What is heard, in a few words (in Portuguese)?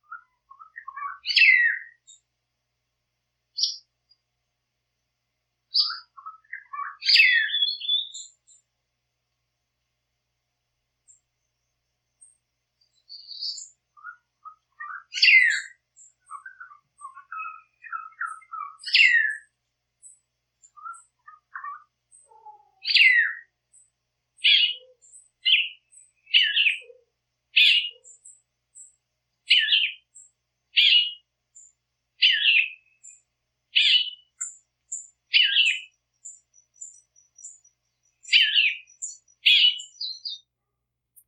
Canto do Tecelao